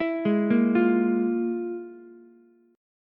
F Minor Major 7th Double Flat 5th, Ukulele; FmM7bb5 arpeggiated; JGuitar

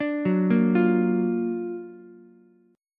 Gb Augmented Major 7th Gb down Ukulele; Gb+M7 arpeggiated; JGuitar

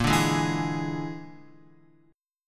Bb 7th Flat 5th Bb, Guitar; Bb7b5; strummed; JGuitar

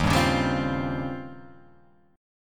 D sharp 13th D sharp

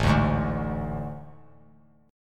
A Major 7th Suspended 4th; AM7sus4; strummed; JGuitar

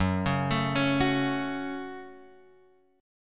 Gb Minor, Guitar, Open F; arpeggiated; x,1,1,4,4,1; JGuitar

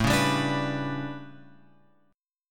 A 11th A, guitar chord; A11 Chord {5 4 5 7 X 7} strummed

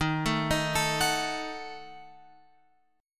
D sharp Diminished D sharp

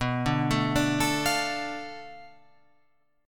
B Minor 7th Flat 5th B, Guitar Open F; Bm7b5; arpeggiated; 11,9,9,9,12,12; JGuitar

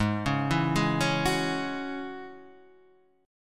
Ab 7th Suspended 4th Sharp 5th Ab down; Ab7sus4#5 arpeggiated

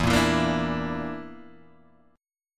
Bb Major Flat 5th E, chord

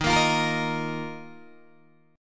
Eb Suspended 2nd, Guitar, Open D

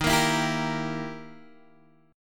E Minor Major 7th Flat 5th Eb

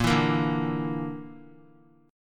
Bb Major Flat 5th, Bb, down; strummed; JGuitar

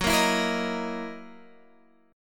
F sharp 13th F sharp down; strummed; JGuitar

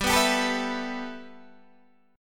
G 6th Add 9th, Guitar, Open D

